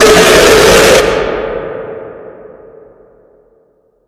jumpscare sound; jolly robotic life 2 0

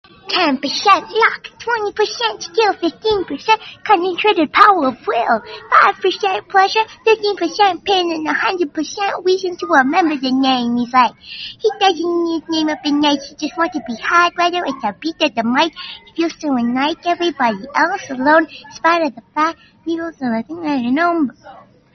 rapping hard in the voice

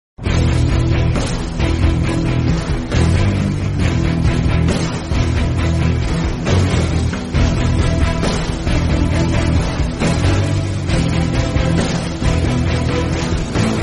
theme

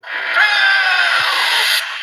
booer jumpscare; ancient

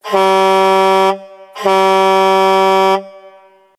semi truck horn

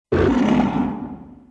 godzilla growl; godzilla 1984

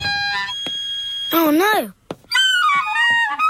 clarinet

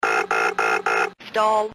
stall alarm

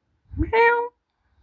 meow

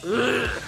bleugh

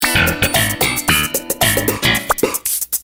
sitcom transition bass riff; seinfeld

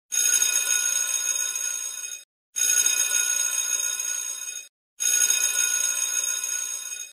bell 3x

